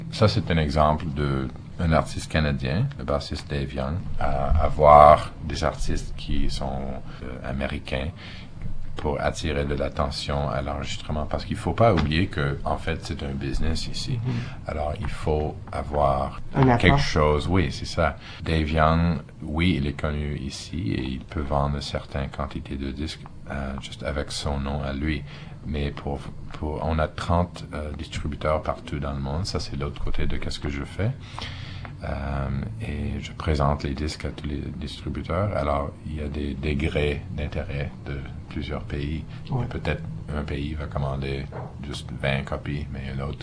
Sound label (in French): Jazz; Importance de lier des artistes connus internationalement à des artitistes d’ici